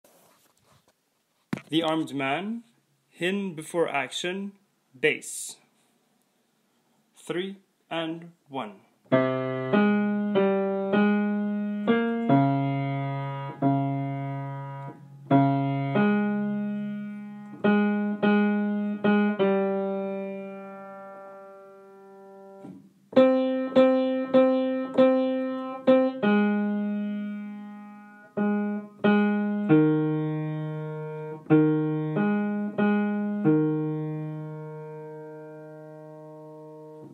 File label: Bass Piano